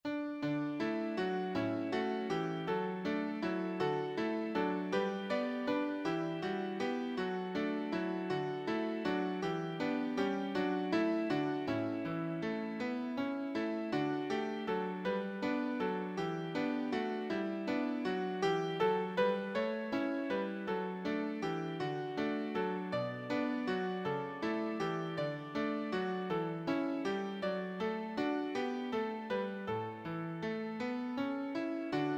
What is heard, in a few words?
PIANO